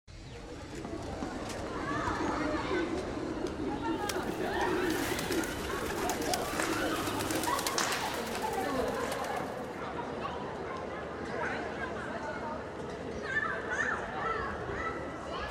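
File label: park with takeoff of pigeons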